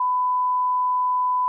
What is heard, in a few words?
bleep long